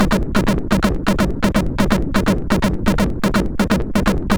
Trance, MOS6581R4; Trance Sector Ultimate

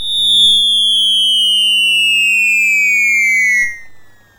Taipan sfx, MOS6581R4

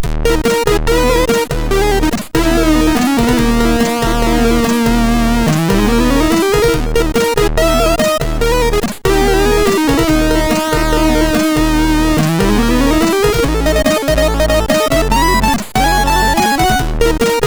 Arabicee, MOS6581R2

